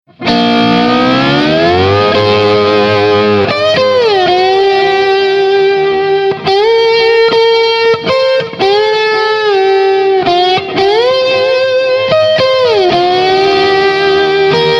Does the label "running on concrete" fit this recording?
No